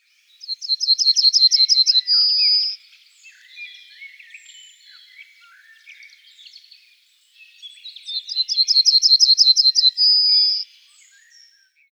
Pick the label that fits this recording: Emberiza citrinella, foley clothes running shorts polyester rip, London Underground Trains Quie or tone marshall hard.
Emberiza citrinella